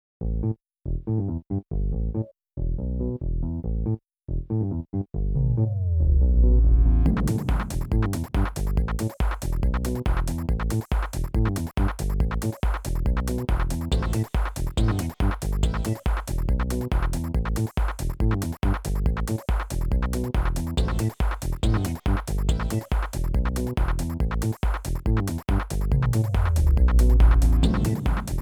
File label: 8bit song